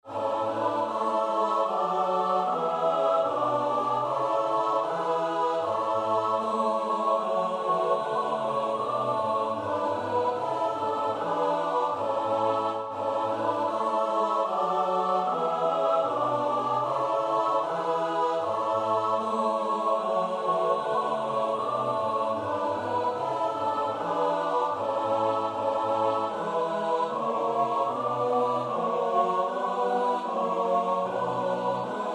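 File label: soprano alto tenor bass; Bach (Johann Sebastiaan); BWV 104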